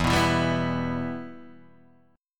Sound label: E Minor E down Guitar Drop D